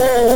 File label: Buzz Buzz loop; Earthbound (Mother 2); Onett